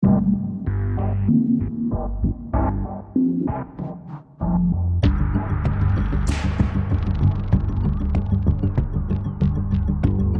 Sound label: Loop Hacking; tales from the borderlands gamerip 2015